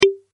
grab Magnet; faradays law

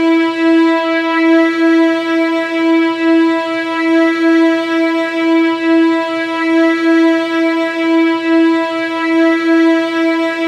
Bodies Strings e3

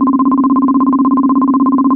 current loop; ohms law

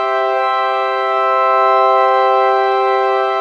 water Balance Flute Chord Loop; mean share and balance

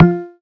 layer Model Base Slider Sound; greenhouse effect

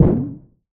launcher Type Explosion; projectile data lab

